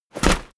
step soldier infantry step

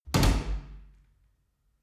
wood door old hard

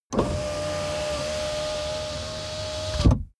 car window back close full

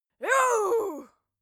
female cheer; schreie; menschen

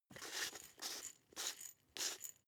glass pepper grind smooth sequence; berufe; gastronomie; soundpool

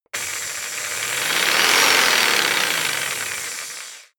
jigsaw engine medium fast short; maschinen; saege

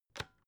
electric whisk button up; haus-garten; kueche

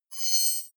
hologram appear, energie

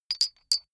small metal handling chink short; industrie wirtschaft; metall

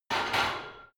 barbell heavy plates replace rack reverby soft; sport freizeit; fitnessstudio

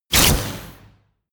flash, Zaps und Buzzes

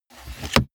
car bmw e46 interior sun visor mirror slide hard

